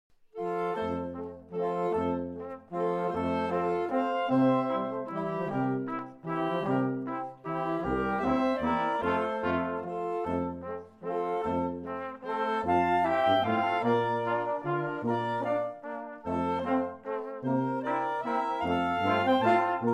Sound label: German Band; Seattle